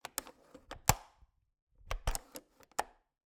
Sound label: Cabin, window, latch, flip, lock, open close, wide; Eiravaein Works; Vaeyan IV